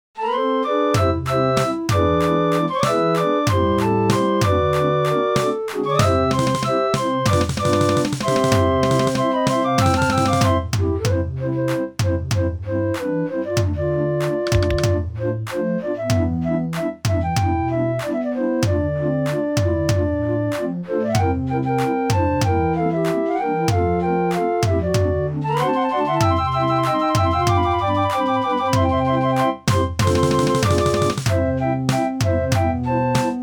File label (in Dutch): draaiorgels